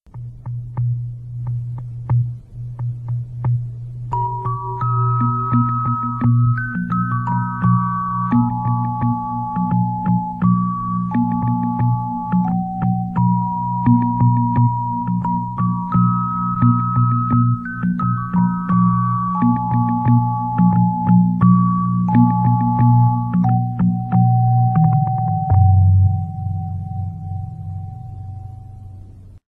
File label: jungle